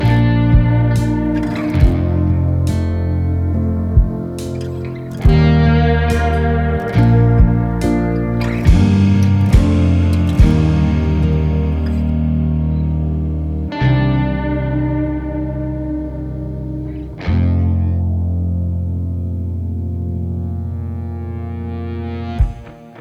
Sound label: Instrumental